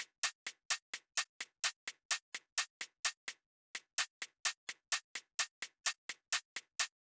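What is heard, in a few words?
Drum Loop 128BPM hi-hat; VEC5; Drumloops; Multi Loops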